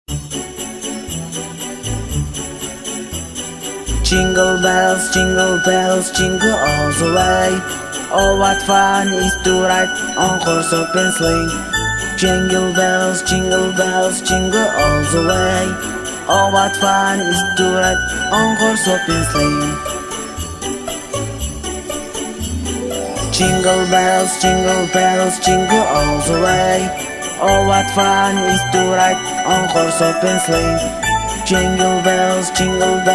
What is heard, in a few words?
jingle bells